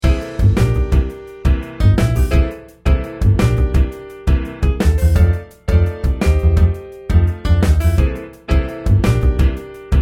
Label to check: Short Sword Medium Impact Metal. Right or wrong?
Wrong